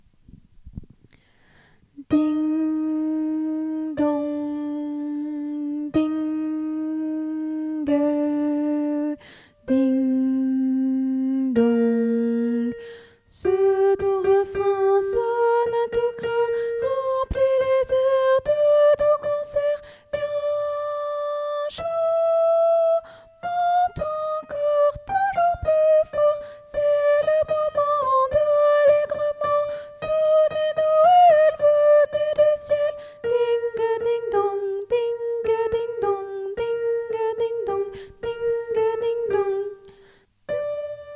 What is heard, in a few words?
tenor; cloches sonnez